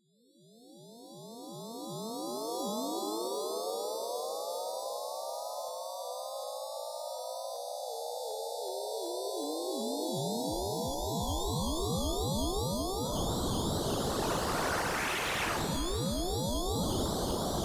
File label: reverberated grain table noise